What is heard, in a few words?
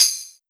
Yamaha RM50 Tambourine